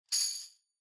Tambourine Shake

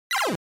NES Shooter Shoot

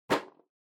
Drawing Box Catch

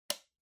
Vacuum Cleaner Switch; 掃除機; スイッチ

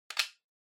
Handheld Transceiver Antenna Rotate Extend

